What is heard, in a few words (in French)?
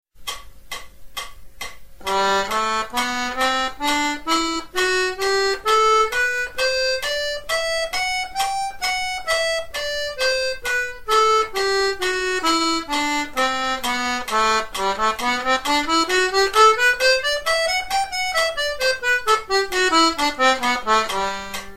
gamme sol pousse tire